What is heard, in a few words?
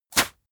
spit blow; koerpergeraeusche; menschen; soundpool